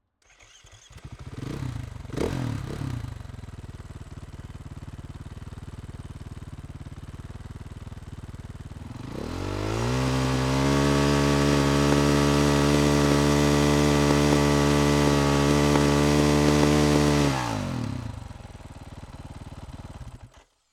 Husaberg 500cc Exterior Startup Blips Idle Steadies In Neutral Shutdown, dirtbike; Husaberg FE501 500cc 2013; Shotgun XY; Pole Position